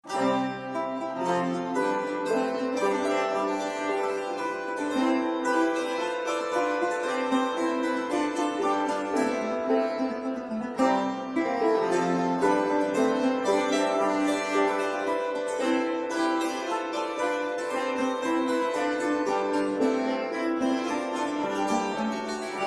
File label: dulcimer lute harp